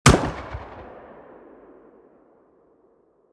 fire dist, 40mm; gmod; arccw uc commons attachments

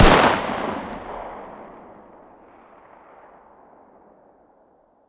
fire dist 9x19 smg ext; gmod; arccw uc commons attachments

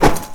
pipe lead light; melee weapons; tfa starwars melee weapons pack

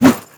spade heavy; melee weapons; tfa starwars melee weapons pack

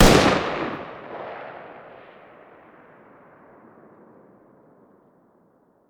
fire dist 556x45 mg ext; gmod; arccw uc commons attachments

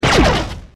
fire; gmod; lfs luna s flight school; laatc atte

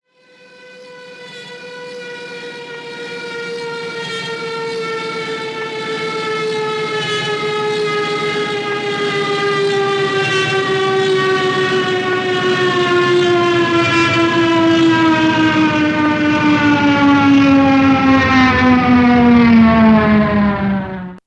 crash, starfighter; gmod; lvs star wars; generic starfighter

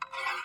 slide; gmod; arccw uc commons attachments

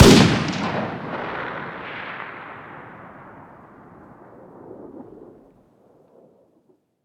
fire dist 308 rif ext

